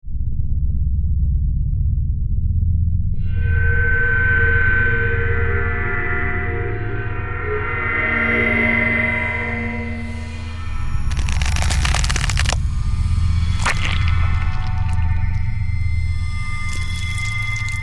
Composition Eerie, Production, Element, Composition, Eerie, Feasting, Horror